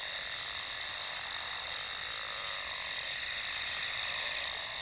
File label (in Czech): cikady; Mafie 1